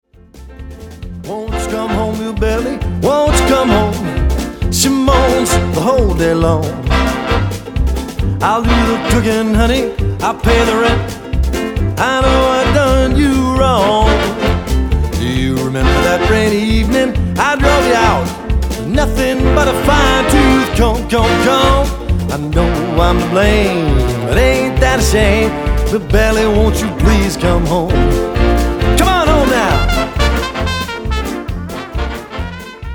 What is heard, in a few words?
big band